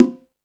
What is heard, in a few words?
Latin Drums, E-MU Pro-Cussion; E-MU Pro-Cussion-1